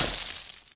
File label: tunneler worm dig out